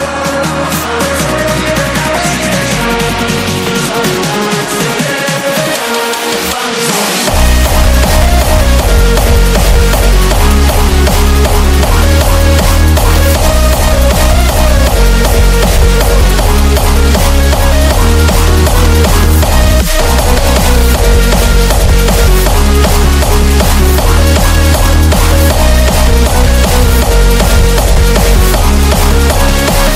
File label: hardstyle